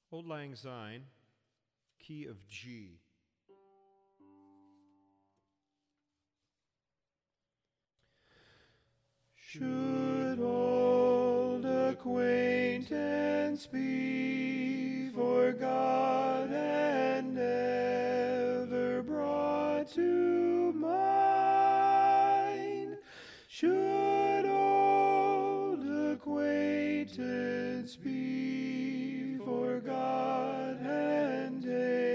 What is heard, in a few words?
Auld Lang Syne tenor